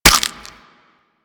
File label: Infested Minion Bite; Warframe; Attacks